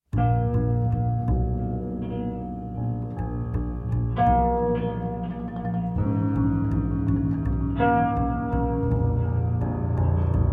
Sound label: Guitarscape; Fulda